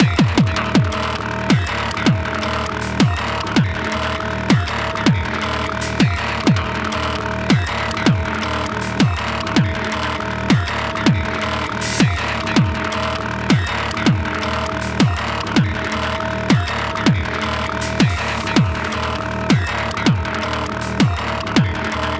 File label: Combat